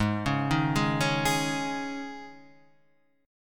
G sharp Suspended 4th G sharp, Guitar Half Step Down